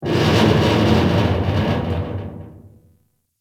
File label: Corpus Ship Rattle; Warframe; Corpus Ship Remaster